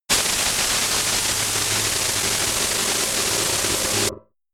splatter, sciene fiction

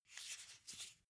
car flip key handling short

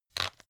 pepper mill fast; soundpool

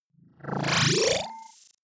sci-fi boot, swooshes swishes

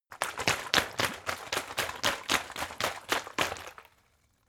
bottle water big shake slow; gastronomie; berufe

